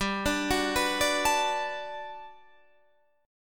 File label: G Major 9th, Guitar, Standard; GM9 arpeggiated; JGuitar